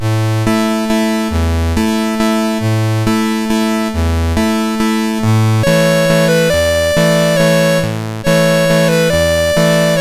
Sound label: Swan Lake Waltz, MOS6581R2